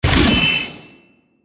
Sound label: Sword Attack